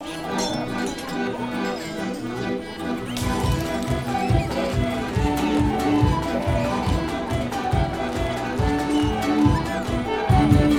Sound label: Tavern Upbeat Stereo; Sogport